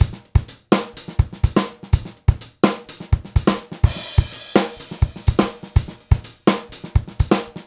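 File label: Beat Loops, 125 bpm; Carnage; Zero-G - Monster Beats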